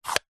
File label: container plastic display case small lid slide close